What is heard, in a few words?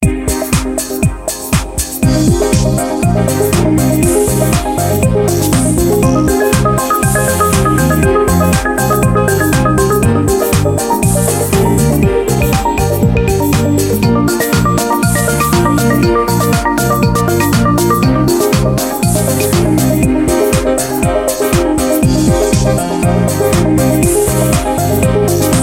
excitement; Infinix Hot 12 Play; alarms